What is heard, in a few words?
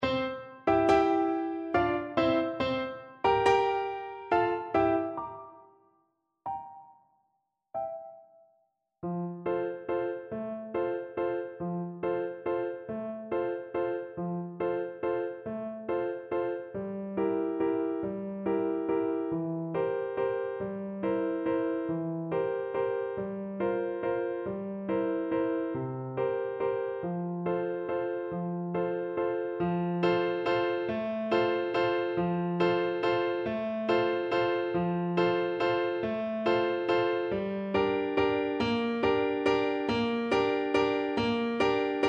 waltz Flute